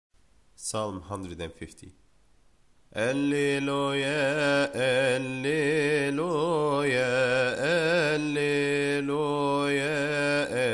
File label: Psalm 150